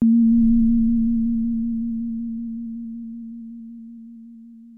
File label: SYNTH Pure Tone Low